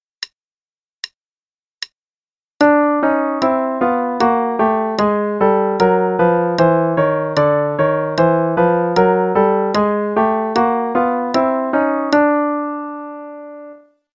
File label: d chromatic Scale only (qn=76); contrary motion, 1 octave, thumbs begin on D; CM Level 4; Daily Warm-ups